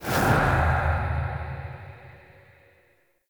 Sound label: UI Customization Menu Close